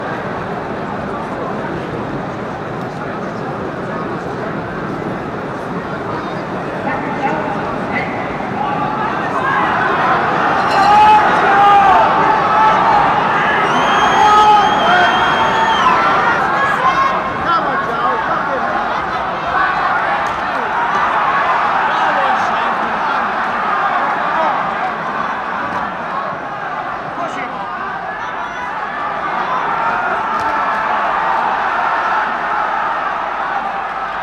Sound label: fight yells, Combat